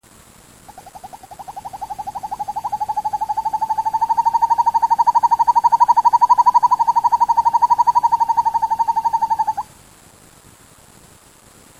surnia ulula

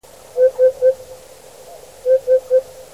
upupa epops

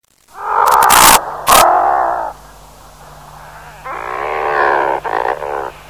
uria lomvia

